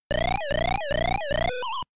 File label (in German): feind tot durch schuss; Dig Dug